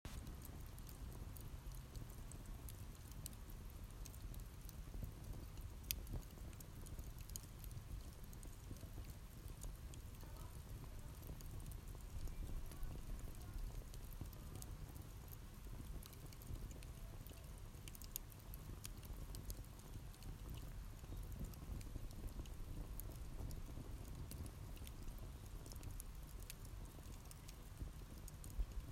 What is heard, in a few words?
Campfire in the garden